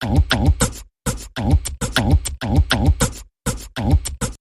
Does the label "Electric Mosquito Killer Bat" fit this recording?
No